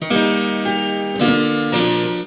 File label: spin toot, Musical Instruments